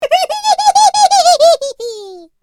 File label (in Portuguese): Animal Rindo